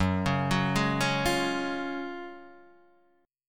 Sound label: Gb Major 7th Suspended 2nd, down, Guitar, Half Step Down